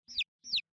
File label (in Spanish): Pollitos